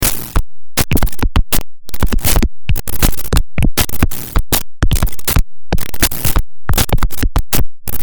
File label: fm7 patches